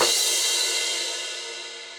Crash 17 Low Loud Squashed, Cymbal; Drums; Drum Hits; Standard Hits; Ableton; Factory Packs; Session Drums Club

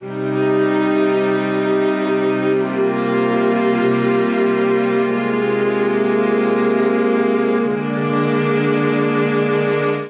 Phrase Tormenting C Minor 95 bpm, String Quartet; MIDI Clips; Ableton; Factory Packs; Spitfire Audio

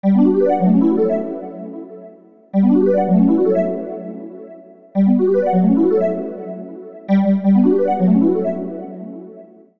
B Minor 86bpm; MIDI Clips; Melodic; Ableton; Factory Packs; Chop and Swing

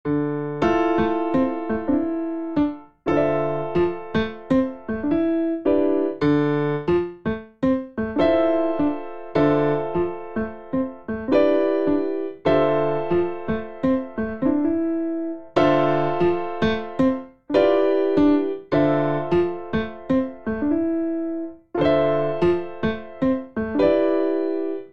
Vamp Bass Arpeggiated D Minor 77 bpm, Grand Piano; MIDI Clips; Ableton; Factory Packs

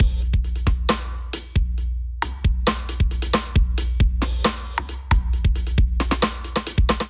Kit groove 135 bpm; MIDI Clips; Cyndal Kit groove; Ableton; Factory Packs; Drum Essentials

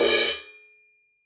CRASH 18 choke, Cymbal; Ableton; Factory Packs; Drum Booth

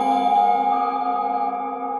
Sad Bell; Mallets; Ableton; Glitch and Wash

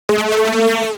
Dubs Siren G# 165 bpm; MIDI Clips; War Dub; Ableton; Factory Packs; Skitter and Step

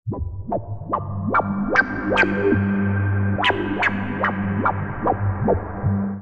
Residual G 145 bpm, Pads; MIDI Clips; Tonal; Skitter and Step; Ableton Factory Packs

